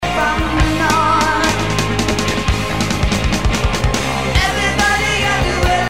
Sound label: Drum Sounds, Fill